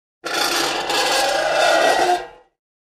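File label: Metal Scrape On Cement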